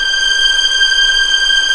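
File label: G6A legato piano, violins; East West; The Ultimate String Collection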